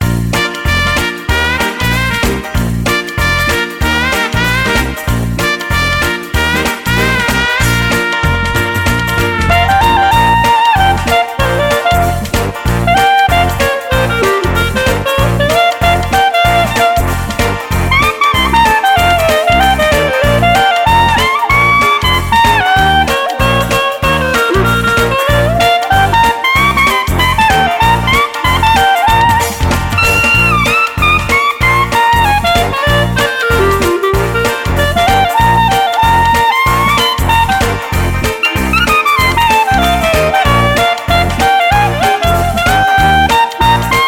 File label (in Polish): klarnet dixie